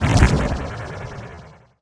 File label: wpn spore launcher; PC Gamer IT CD 47; Centauri; alien-x-fire-demo